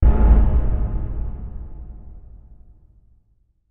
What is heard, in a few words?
Bass Impact Tone Bel; impacts